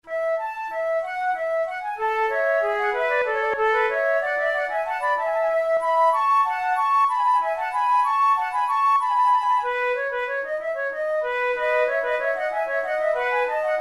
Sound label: concerts for two flutes gigue a la maniere italienne; Monteclair; flute tunes